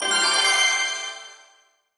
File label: quest start